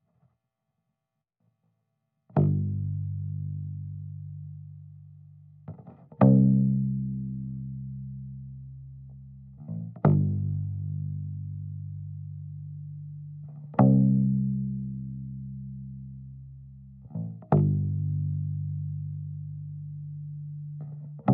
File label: 60's Combo